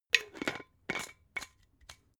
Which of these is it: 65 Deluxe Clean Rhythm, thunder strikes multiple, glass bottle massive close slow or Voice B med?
glass bottle massive close slow